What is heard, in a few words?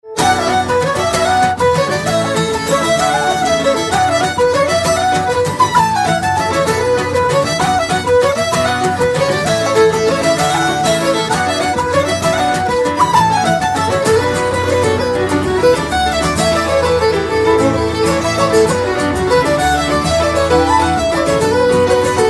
jig